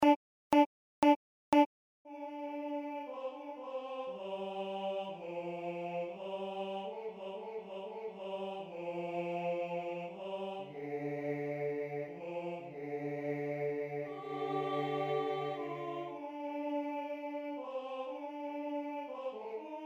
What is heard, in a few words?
soprano